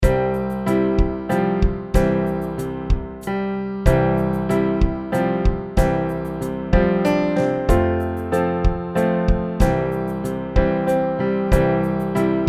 No Melody, ukulele; Rainbow Ukulele

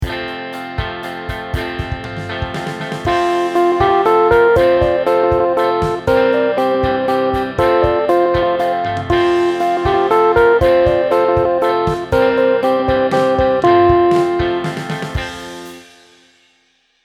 ukulele; Rainbow Ukulele

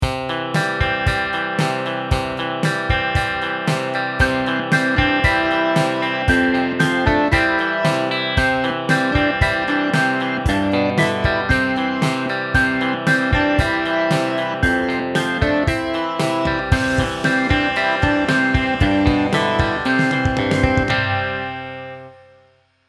ukulele; Rainbow Ukulele

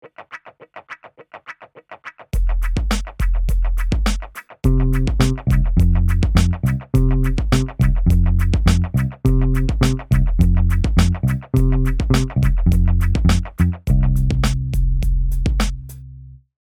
NO MELODY, ukulele; Rainbow Ukulele

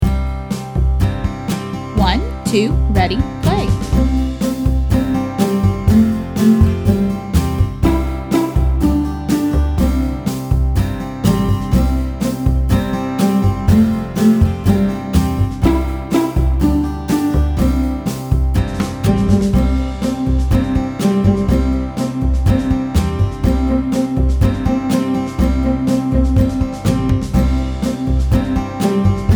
Old MacDonald, ukulele; Rainbow Ukulele

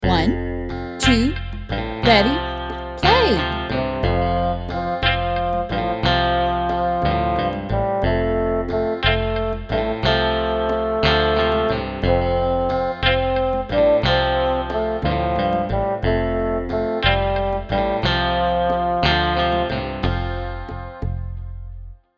SLOW Tempo, ukulele; Rainbow Ukulele

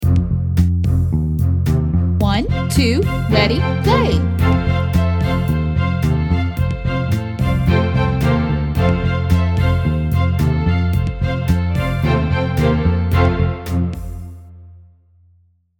Rain, Rain Go Away WITH INTRO, ukulele; Rainbow Ukulele Play-Along

